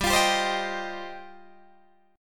G 7th Suspended 4th Sharp 5th, Guitar DADGAD

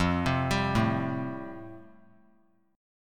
F Major Add 9th; Fadd9 arpeggiated; JGuitar

